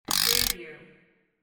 clock wind

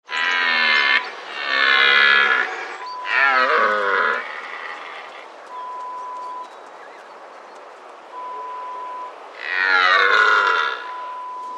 Stag

